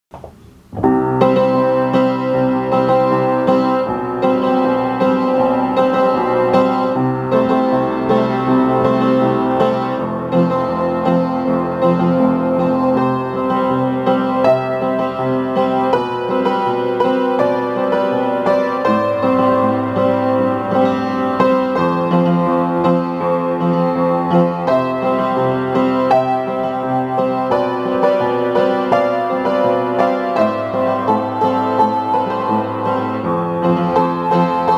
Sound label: piano cover